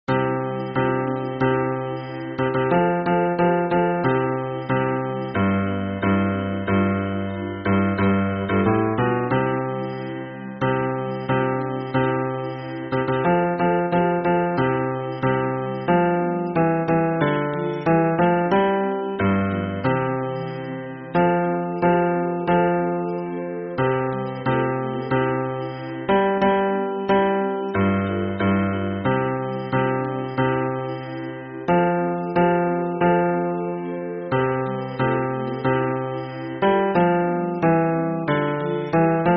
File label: hymn bass; 末日聖徒イエス・キリスト教会